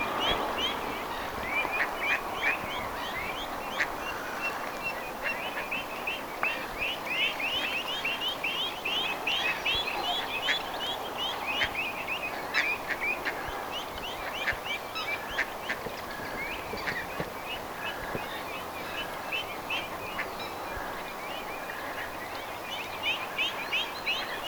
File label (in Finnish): olisiko haapanapesueen aania arvaan naaraan aanista paatellen, haapanan poikasen ääniä?